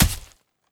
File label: Sting Attack